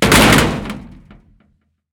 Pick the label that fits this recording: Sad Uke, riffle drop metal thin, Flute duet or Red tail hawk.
riffle drop metal thin